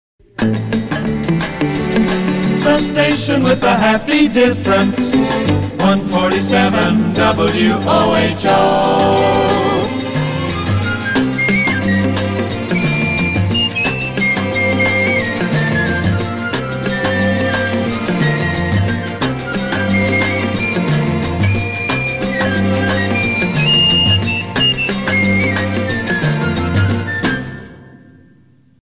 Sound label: Bossa Nova; PAMS